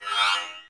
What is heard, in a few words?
launch Menu Open; Tribes2